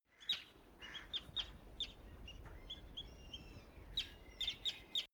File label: bird chirp forest; natur tiere; voegel